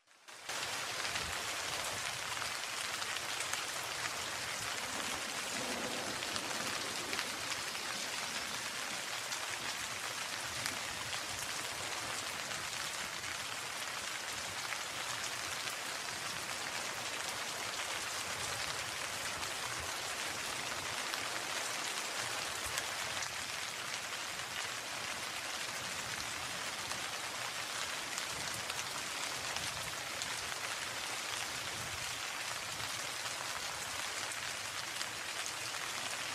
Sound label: Rain